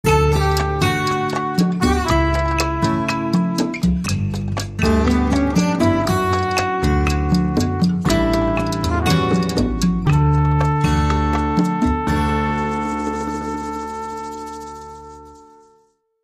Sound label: Lullaby